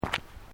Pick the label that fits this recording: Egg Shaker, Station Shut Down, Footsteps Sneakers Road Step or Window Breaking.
Footsteps Sneakers Road Step